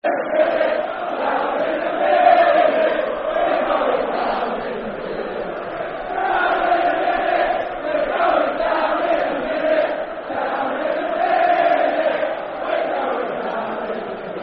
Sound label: We're Going Down In A Minute Football Chant (Soccer Song); Gillingham Gfc